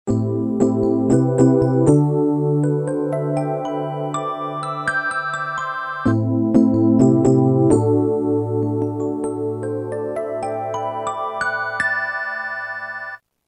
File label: Night Moods, Roland M-DC1